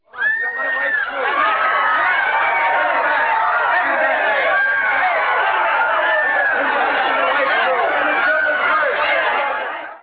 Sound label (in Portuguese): multidao; 7invasores